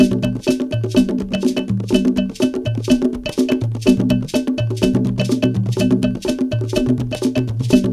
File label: DRUM CAB BELL; Zero G - Ethnic